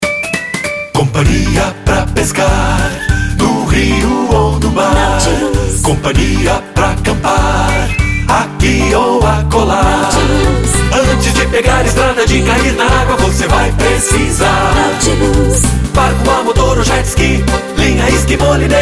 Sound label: JINGLE; CIANAUTILUS